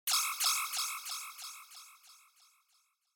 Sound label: Single Accent High Delay